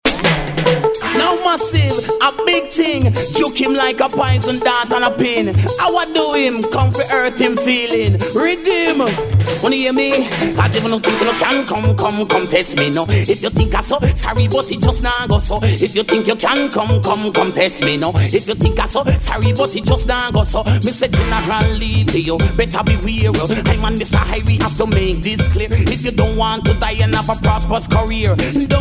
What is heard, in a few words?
SAX